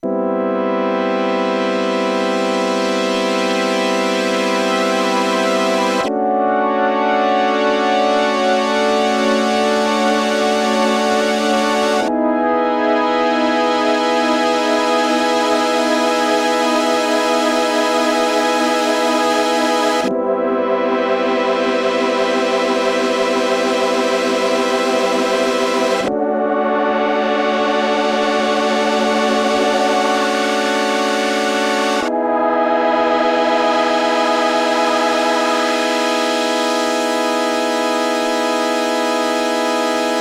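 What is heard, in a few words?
MOD Devices MOD DUO X J6 Chorus Flanger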